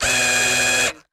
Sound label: Electric Drill Tight; Sound Effects Bible